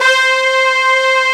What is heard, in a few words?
BRASS, Brass Bones, Brs Layer